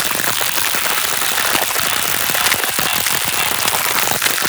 Portal Shatter Closer Loop; Warframe; Duviri

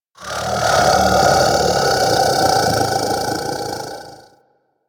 Vocal Snore; Warframe; Hunting Critters; Orokin Kubrow

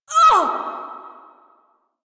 Pain Lich B

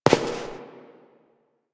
Step; Warframe; Koumei

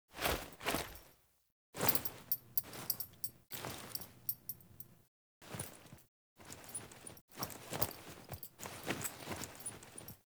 Foley Action Cough React Large; Characters; Player

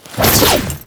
Ninja Stealth Hit React, Foley; Tau Dax

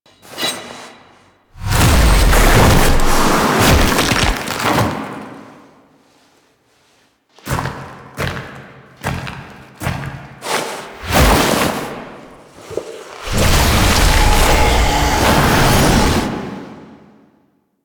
Blade Against Throat; Duviri; Teshin

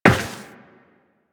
Step Metal, Footsteps Walk; Warframe; Tenno

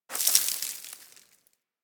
Settlement Sand Fine Debris

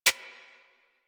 Decoy Counter Measure Mech

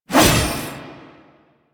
Whoosh D; Tatsu Prime; Revenant Nikana

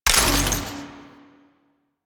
Ammo Pickup Magnetic; Ammo Ability; DE; Warframe; Frumentarius

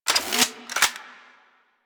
Arch Dual Pistol Equip; Weapons; Warframe; Archwing

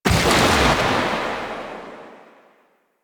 Shot Alt, Rifle; Weapons; Warframe; Thanotech; Trumna Prime

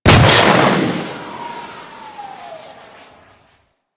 Pet Rocket Fire; Abilities

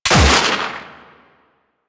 Revolver Pistol Shot

